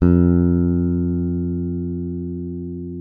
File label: F2, FINGER MUTE; Xsample VOL-1